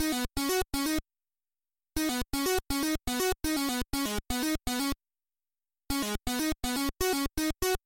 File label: SWINGING SEQ DBL